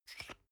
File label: toothpaste screw cup open medium single